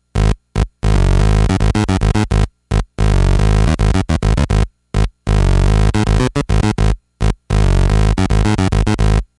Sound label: snv pulse low; Thomas Henry; SN-Voice